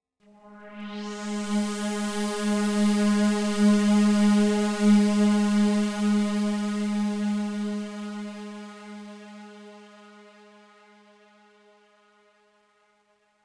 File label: Oneshot Synth